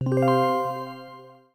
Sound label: Button